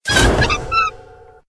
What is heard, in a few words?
reeling backwards; Poodletooth-iLand